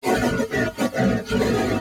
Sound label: Horse Trot Breath; Warframe; Duviri Horse; Vehicles